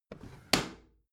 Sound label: bathroom drawer close regular